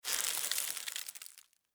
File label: UI Make